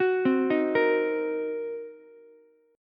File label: C Minor 7th Flat 5th C down Ukulele; arpeggiated; JGuitar